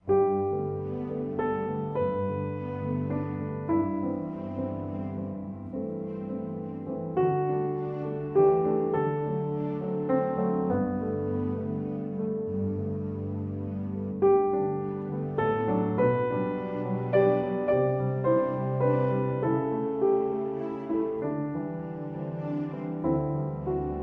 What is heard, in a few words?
Lullaby With Strings; 60sec